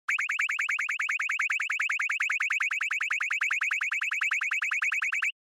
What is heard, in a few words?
Panic Fast; Onoma